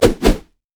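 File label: armadillo basic attack; rammus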